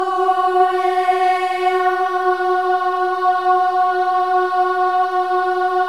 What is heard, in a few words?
OH EH; Spectrasonics